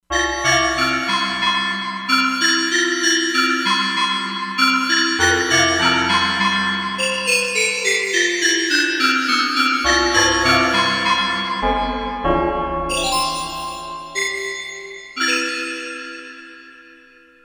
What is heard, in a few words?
Autumn Bell; Korg MSC-16S Environment